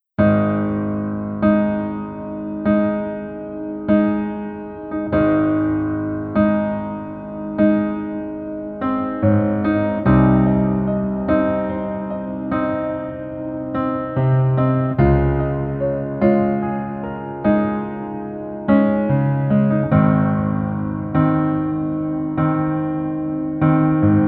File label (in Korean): Piano ver 멜로디MR 여자키